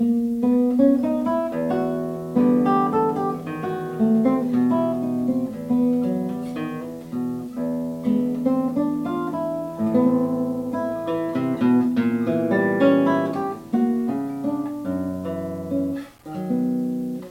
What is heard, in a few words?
Vals Preludes Air and Dances